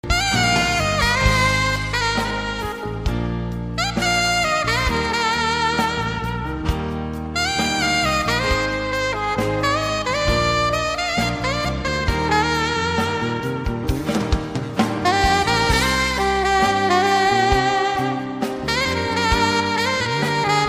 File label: saxophone cover